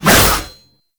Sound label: Shield And Sword Whoosh